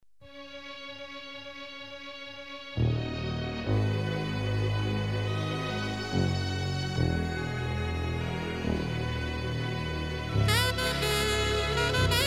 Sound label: Start [Instrumental]